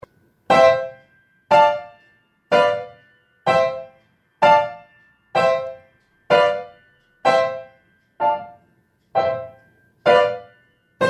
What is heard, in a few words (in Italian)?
accordo perfetto dx; Kabalewski; Il riccio; cavaliere selvaggio